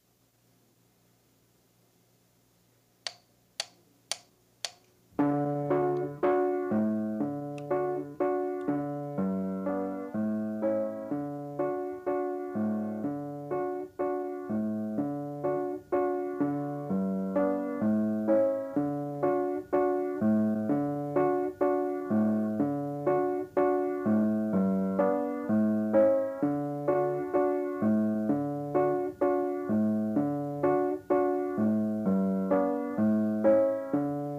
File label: piano